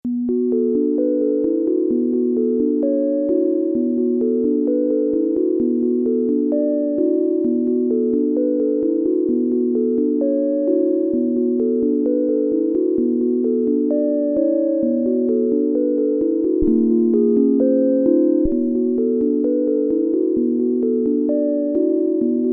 Eerie False Oscillator

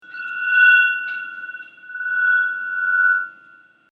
cupped hand controlled feedback

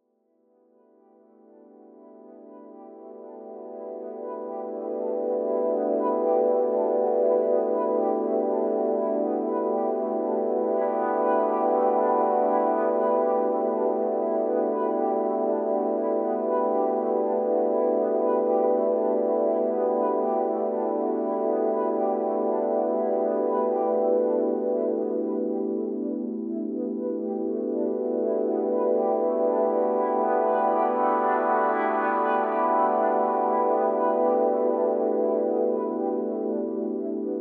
Ambient Arpeggio

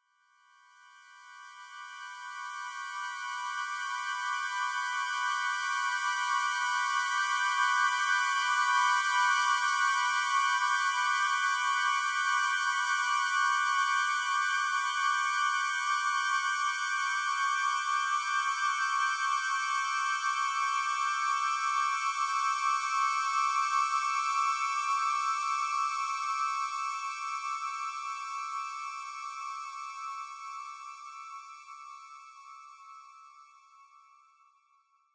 Synthesized Japanese Sho Reed Instrument

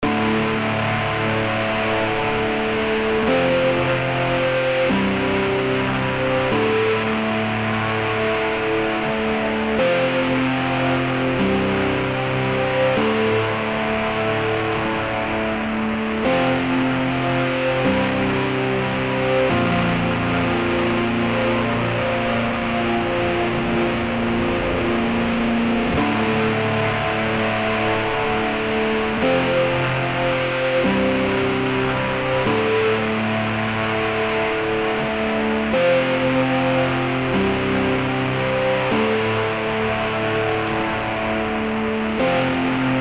piano destruction

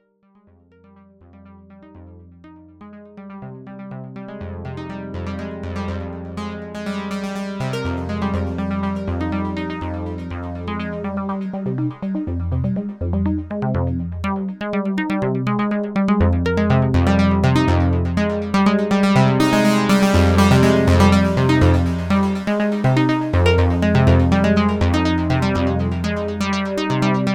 BS3X Driven Broken Arpeggio

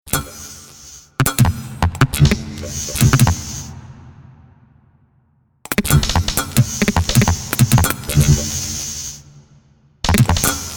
hammering

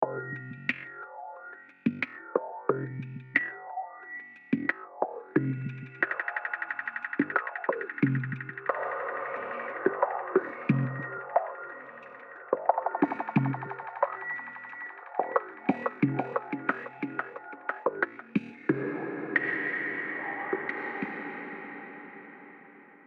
pitched and processed loop

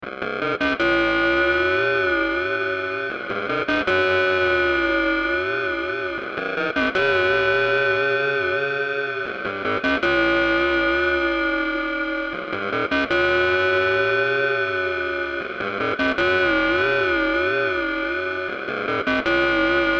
rhodes line out of context

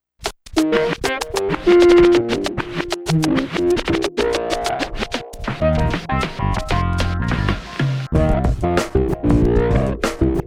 zhiguly

